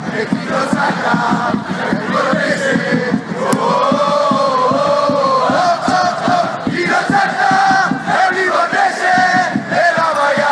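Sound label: best pisa chants, football chants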